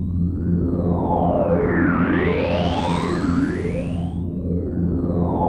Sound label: MAGIC ARPS; Zero-G Ambient 1